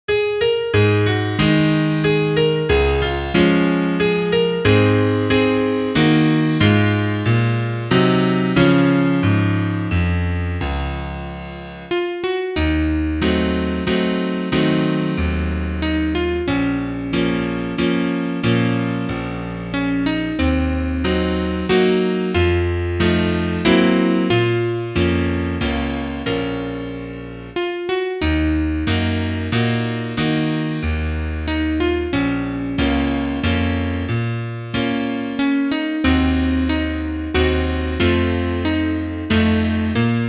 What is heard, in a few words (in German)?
Klavier Solo, trompete; Cantilene